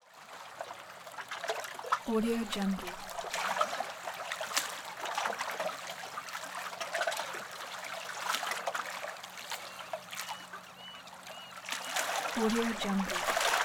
waves in dock ambience

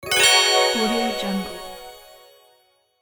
magic bells

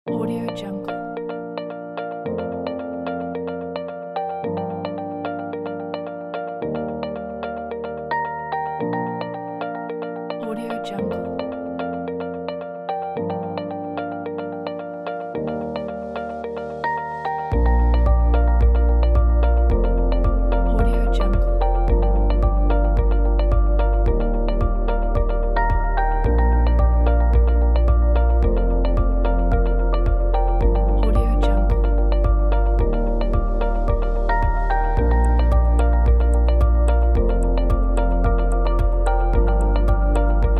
ambient pack

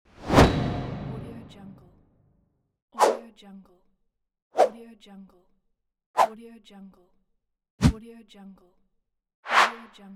swooshs

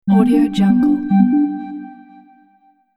notification for sms menu

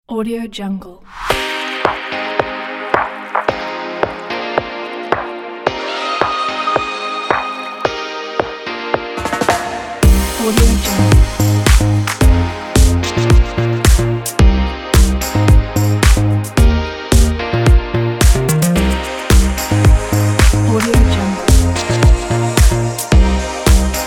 tropical waves house

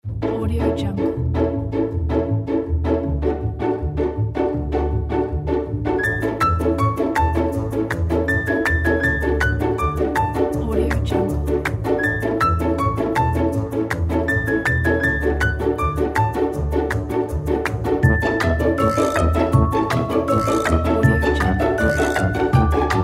this funny quirky

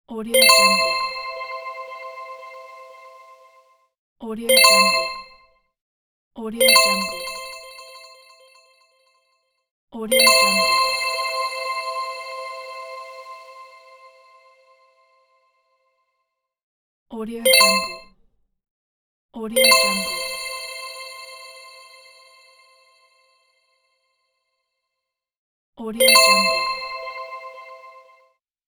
message notification

